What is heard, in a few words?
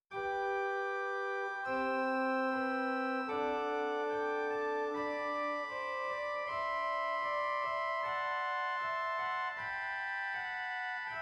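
Bovenpositief Octaav 4, organ; zwolle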